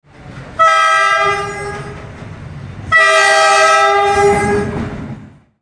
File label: loud train horn sound